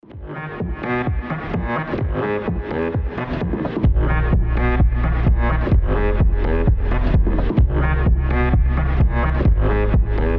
saxophone

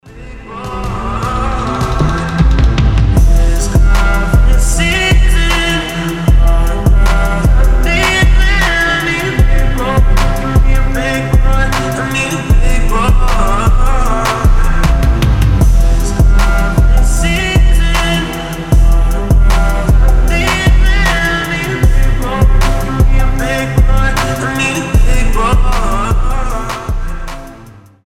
slowed reverb remix

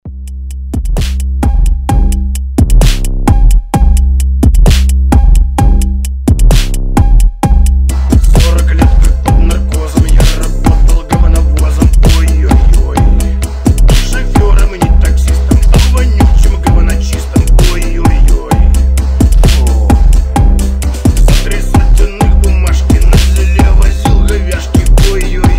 phonk remix

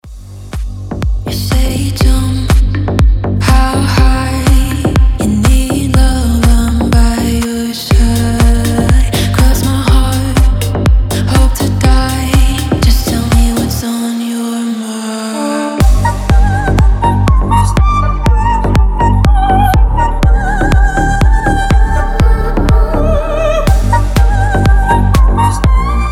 organ ave maria

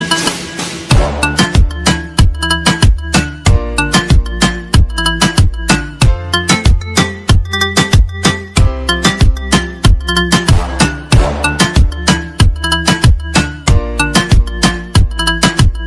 super cool siren remix

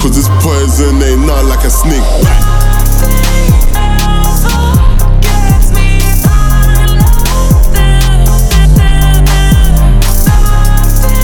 slowed bass boosted

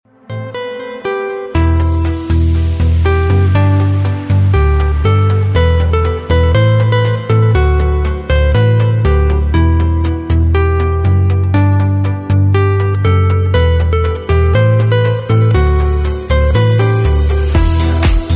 guitar deep mix ringtone

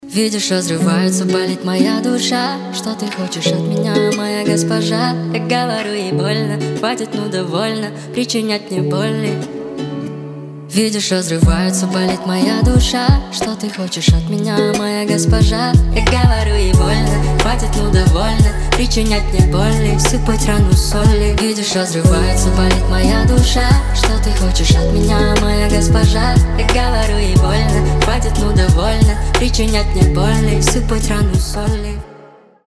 speed up

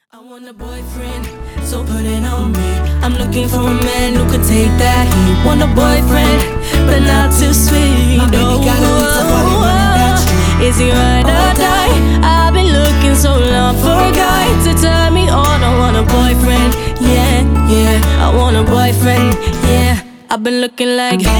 acoustic

